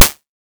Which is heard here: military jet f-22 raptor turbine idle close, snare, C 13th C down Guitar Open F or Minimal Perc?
snare